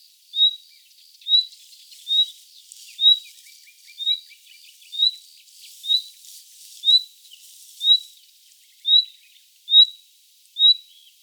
call, Nightingale, The call is repeated over and over probably because I am close to the bird. Background: other Nightingales sing nearby.; luscinia megarhynchos